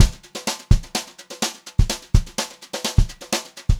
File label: JZ BEAT, Brushed Drum Loops; USB Soundscan vol.39; AKAI; 90 s Sample CDs; 126 JAZZY